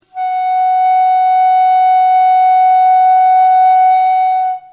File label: F sharp 5, flute classical